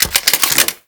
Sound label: Goo Gun Reload